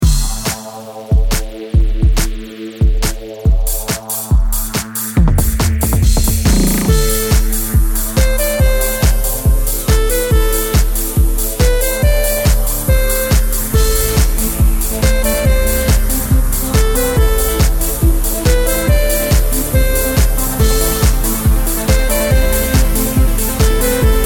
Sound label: space club